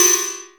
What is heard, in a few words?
CLANG; E-MU Formula 4000 Series Vol. 1; Hip Hop Nation